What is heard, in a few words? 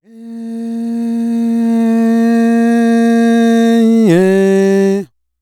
African Lead Vocal; Continental Drift Aftershocks; Vocals